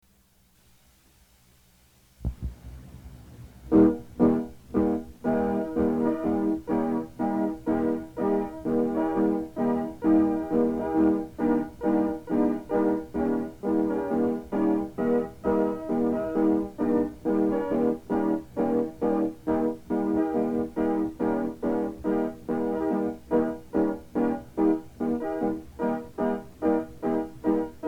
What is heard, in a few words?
chorus